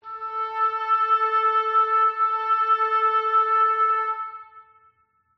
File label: Oboe at 440Hz; music theory